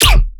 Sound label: bass hit surprise E; Virtual Riot